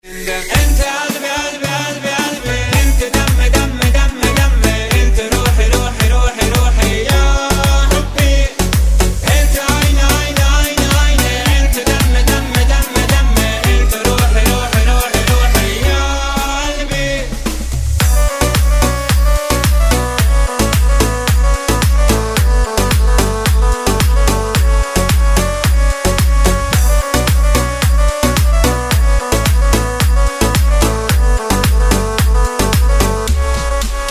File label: Mobile Ringtone